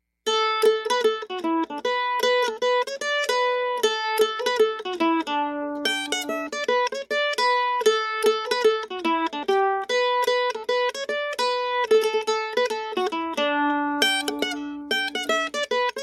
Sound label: Mandolin